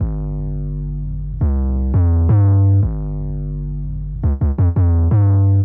Hammer Bass, Drum & Bass; Fluid Dynamics

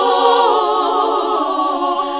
SING, CLASSIC VOX1; Best Service; Hallelujah

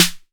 808 SD Tape, Snare Drum; Goldbaby Tape808 & Tape909

